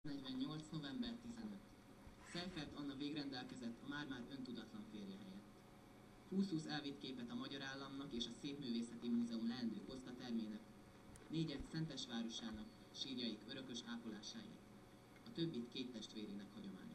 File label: digitar